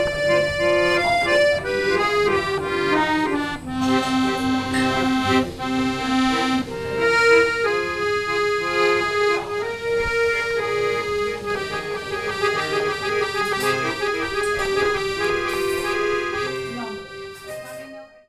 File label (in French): accordeon intro